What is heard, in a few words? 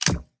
switch click; soundscrate